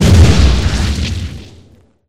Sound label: Big Explosion; Teen Titans go! H.I.V.E. 5 Robin Vs See-More